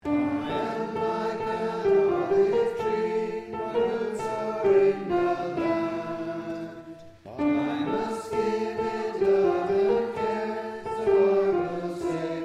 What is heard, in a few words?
tune